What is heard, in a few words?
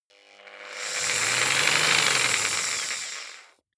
jigsaw engine slow short; maschinen; saege